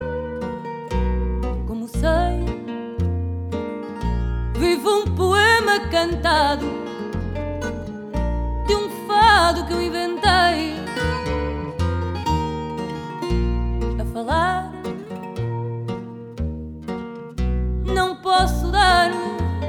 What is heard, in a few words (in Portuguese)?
Fado